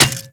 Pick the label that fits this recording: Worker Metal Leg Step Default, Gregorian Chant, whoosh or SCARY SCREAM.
Worker Metal Leg Step Default